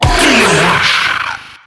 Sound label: Infested Lich Pain D; Zeke